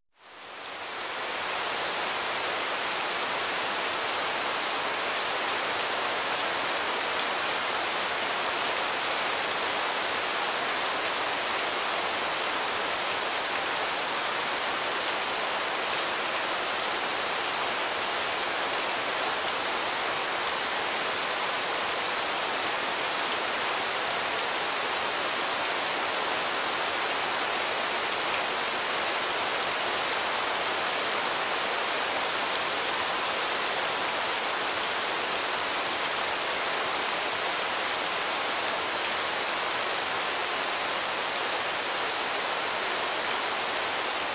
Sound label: Heavy Rain; 大雨; ＮＨＫクリエイティブ・ライブラリー